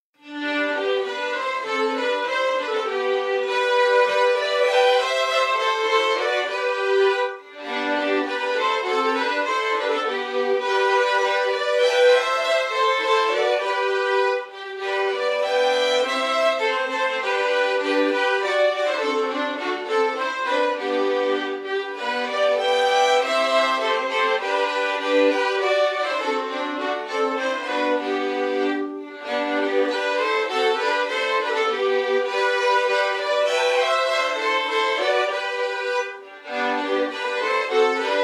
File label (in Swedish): Polska; Polska efter Mårten Persson Ingelstorp